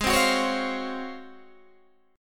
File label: Ab 13th down Guitar DADGAD; Ab13 strummed